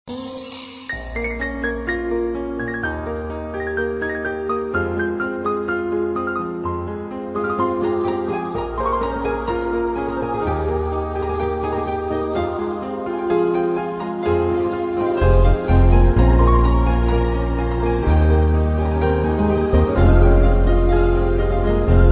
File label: Sad Love Instrumental Ringtone